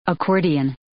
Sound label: accordion